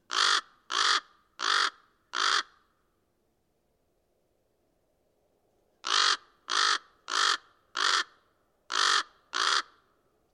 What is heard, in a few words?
raven alone call repetitive